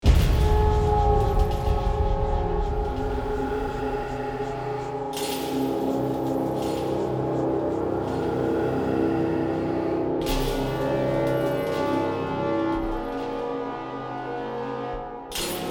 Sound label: Ambience; Saw II Flesh & Blood